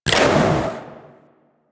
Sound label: Grenade Throw; Grineer